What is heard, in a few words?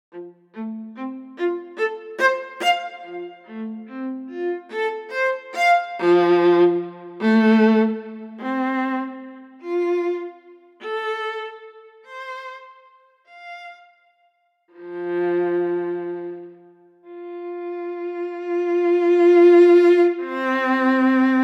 solo viola; basic art